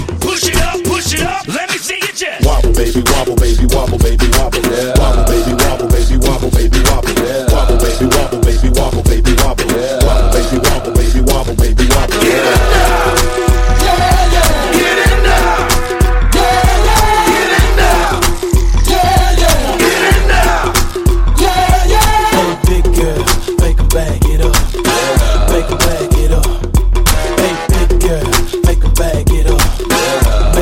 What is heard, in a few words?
wobble ringtone